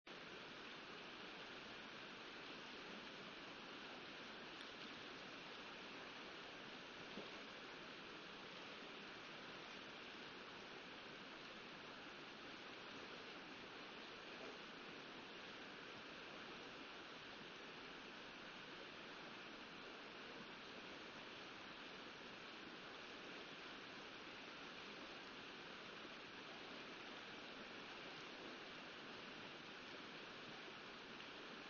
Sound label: riverside